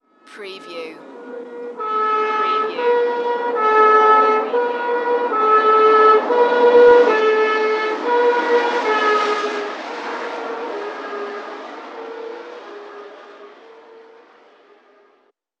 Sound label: Fire Truck Siren Driveby Paris Vintage